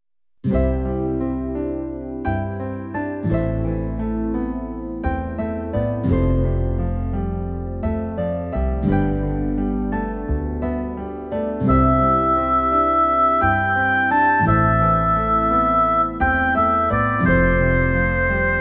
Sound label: wind hill